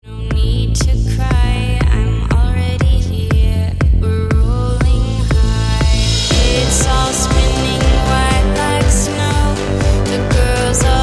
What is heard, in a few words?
Pop; Internet Session, Los Angeles